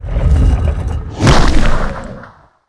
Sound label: golem attack